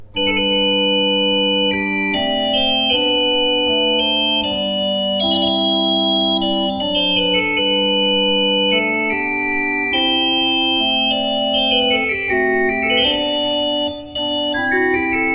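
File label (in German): Tzschoeckel 5 I, pfeifenorgeln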